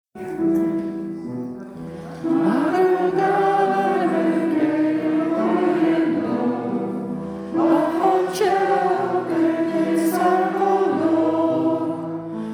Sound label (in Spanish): Tutti